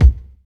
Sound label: Boom Kick One Shot C Key, Royality free bass drum one shot tuned to the C note.